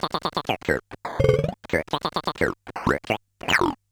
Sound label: Bent Talking Teacher, Circuit Bent